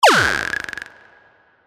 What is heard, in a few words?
Impact